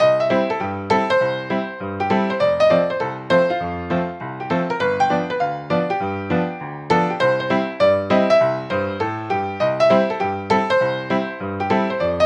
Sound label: Saloon; wild west guns ios wii gamerip 2008